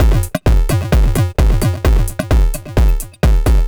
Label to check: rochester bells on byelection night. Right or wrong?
Wrong